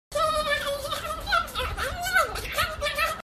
wild laughing tape

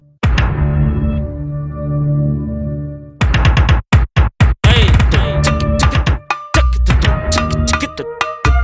hard beat; netflix

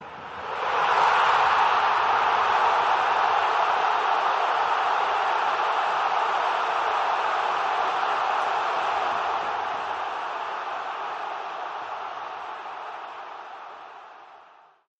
goal sound

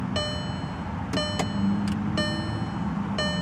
vw chime

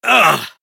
pain; kaiba

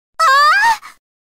scared anime girl scream

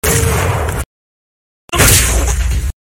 punching effect; jotaro

